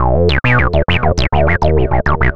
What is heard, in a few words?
Synth Bass; Basses